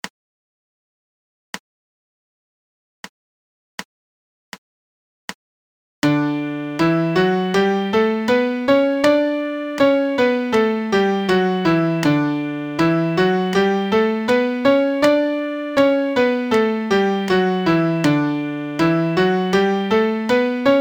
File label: MIDI Major Scale D